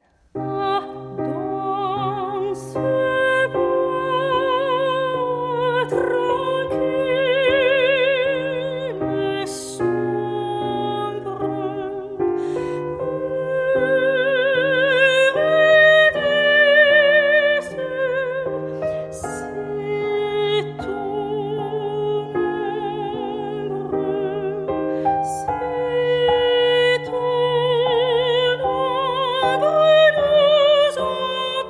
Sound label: soprano; gluck; ah dans ce bois tranquille